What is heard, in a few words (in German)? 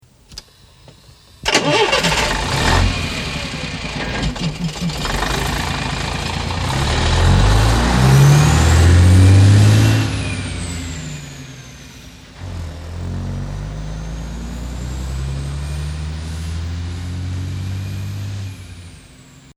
postauto abfahrt; Abfahrt eines Postautos; Reisepost - Unterwegs mit Kutsche und Postauto